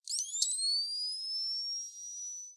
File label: bazooka reload tone; Blind Drive